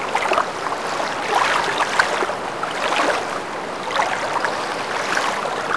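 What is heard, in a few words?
water wave; envrn; The Elder Scrolls III Morrowind GOTY; XBOX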